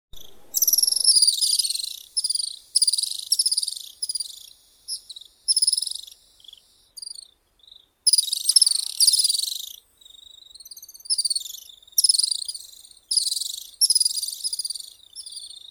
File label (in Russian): zvuki prirodi strizh malii apus affinis